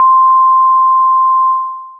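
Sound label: sinusoid, Waveframe Waveforms; Retro Synths; Ableton; Factory Packs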